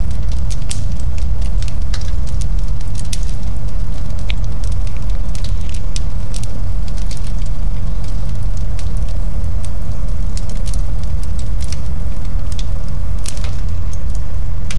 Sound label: fire